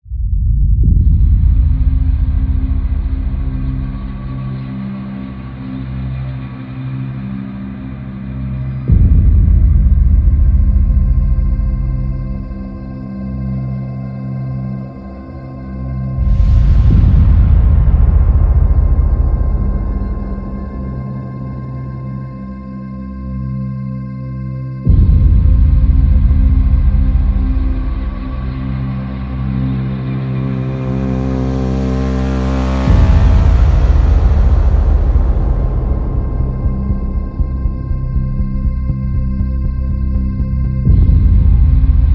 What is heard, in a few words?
Probing, No Keys